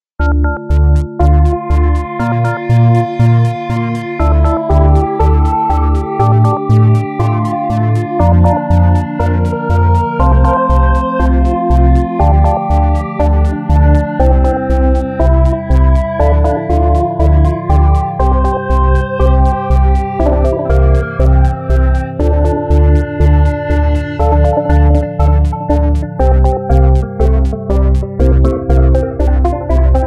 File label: pad soberminded